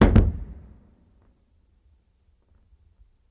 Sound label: Staff Hit Single, spears & staffs